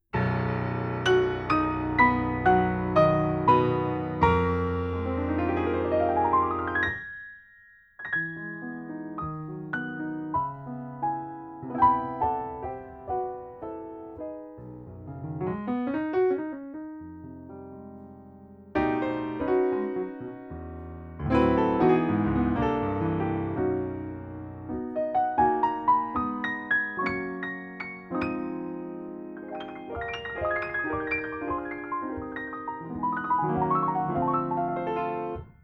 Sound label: Miktek C5, piano; how to record a piano